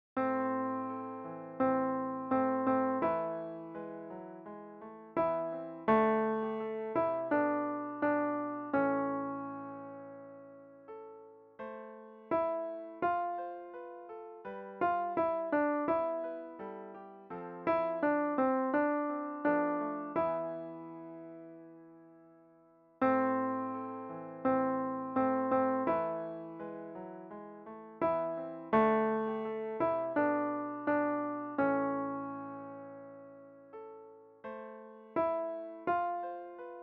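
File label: Contralto, MIDI; Lord, Make Me to Know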